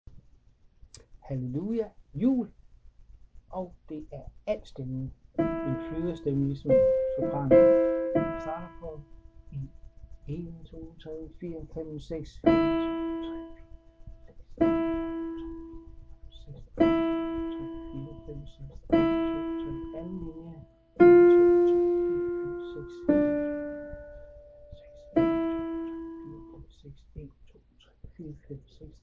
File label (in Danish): alt stemmen